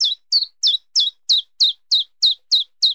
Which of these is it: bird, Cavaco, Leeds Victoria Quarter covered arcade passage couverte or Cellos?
bird